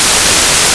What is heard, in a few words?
steam; de grind